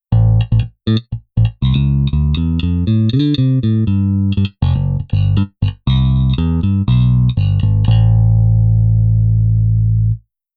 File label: Bass Brend